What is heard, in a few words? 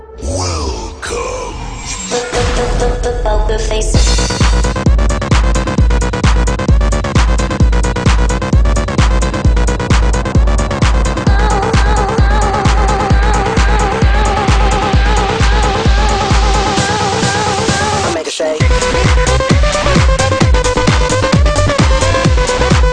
music; Endoplazmik